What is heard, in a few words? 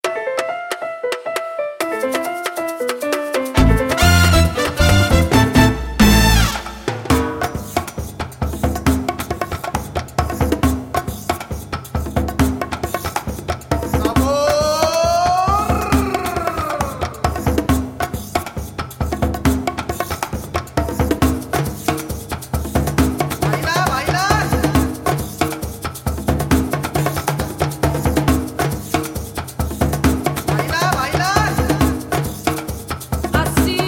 lemon merengue, ultimate latin